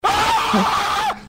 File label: aaaagggghhhhhhh